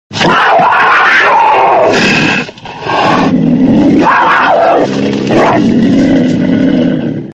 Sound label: cougar growl